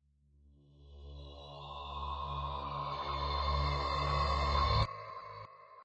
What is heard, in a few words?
Shrt Creep, Short Creepy sound.; Scary Tones (Sound Effects:Horror)